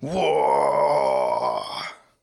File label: flame give short; Brumm